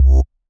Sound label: reset block; netent